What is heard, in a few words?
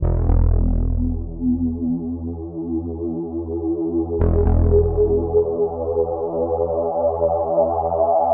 115 E Drone Harmonic Rez; Zero-G - Future Wave; Drones